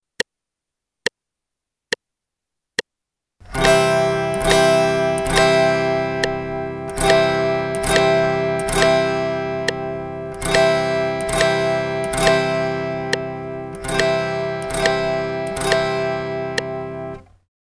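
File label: G strum quarter notes pattern 2 C, guitar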